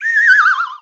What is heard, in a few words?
chik fall